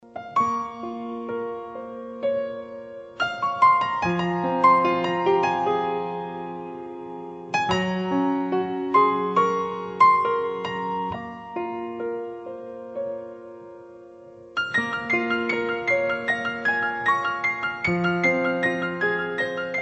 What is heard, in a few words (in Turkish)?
SANTRAL MÜZİK